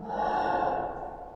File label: attack; creatures; oerkki